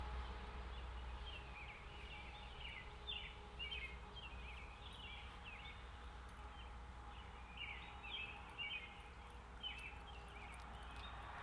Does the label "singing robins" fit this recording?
Yes